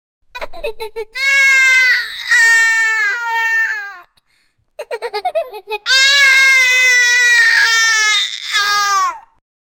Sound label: 5 years boy crying